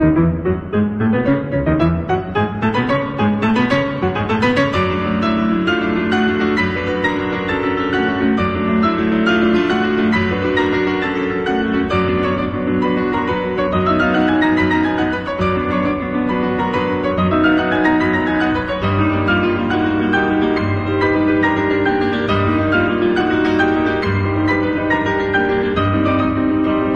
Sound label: piano, Waltz; Mephisto Waltz No. 4